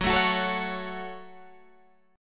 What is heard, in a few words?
Gb Minor Gb Guitar Open G